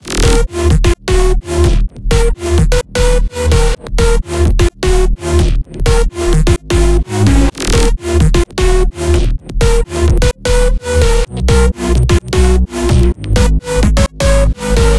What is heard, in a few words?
128 BPM D# Min Sidechained; House; Drop Loops; Loop Stems; Cymatics